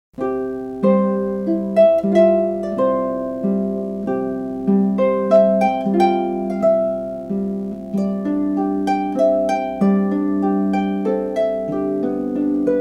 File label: Clarinet Concerto in Bb Major; exerpt Mozart